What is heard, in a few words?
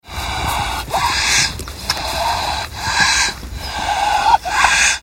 capybara; Nestle Animal Planet Land